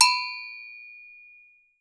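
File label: agogo low; E-MU Producer Series Vol. 7 – Old World Instruments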